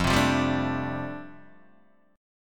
F 6th Add 9th F down Guitar DADGAD, chord

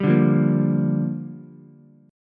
G Minor 7th, Ukulele; Gm7 strummed; JGuitar

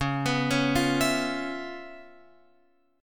C sharp 7th Sharp 9th C sharp Guitar Open F; arpeggiated; x,8,11,8,8,11

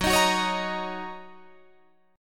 Gb 6th, Guitar Drop D

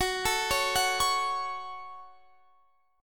Gb Minor Guitar Standard; arpeggiated; JGuitar

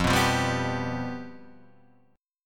F Suspended 2nd Flat 5th, F; Fsus2b5 strummed; 1,2,3,0,0,3

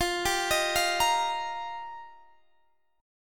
G Minor 7th Sharp 5th, F

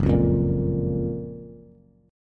F sharp Major 7th, Bass; strummed; JGuitar

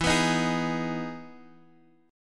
E Major 7th E; strummed; JGuitar

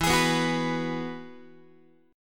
E Major Add 9th E, Guitar; strummed; JGuitar

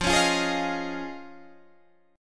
F Minor 7th Sharp 5th; strummed

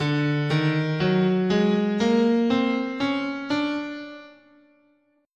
D Enigmatic scale

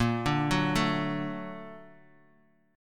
Bb Augmented Major 7th Bb down

